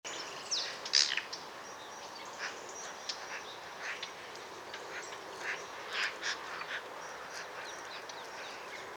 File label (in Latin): Pica pica; SETE